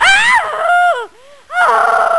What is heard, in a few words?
scream; spook